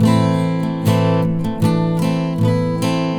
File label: Strummed, Acoustic Guitars; ACID MUSIC